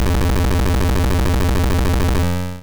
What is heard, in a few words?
Thunderbolt; sfx; attack moves; pokemon; gen 1; rby